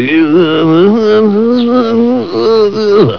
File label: song